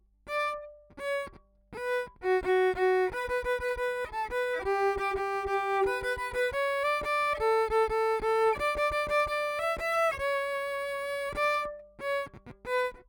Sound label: Violin DI Para Acoustic DI